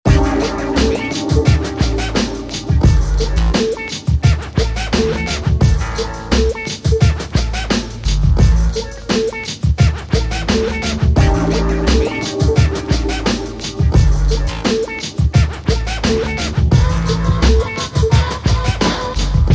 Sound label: techno